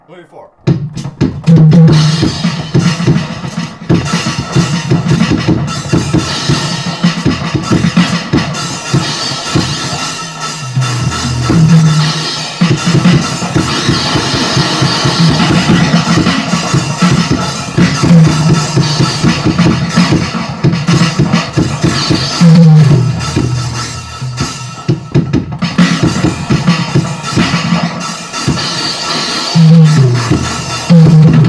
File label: Free Jazz Drum Solos